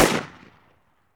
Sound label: ak 47 single shot